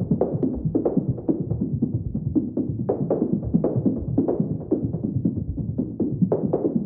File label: Loops 140 BPM Hihat Percussion Loops